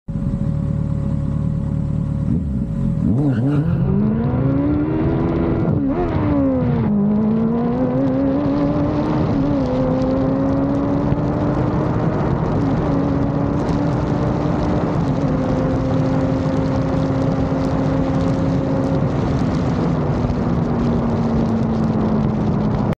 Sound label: t rex motorcycle; موتور تی رکس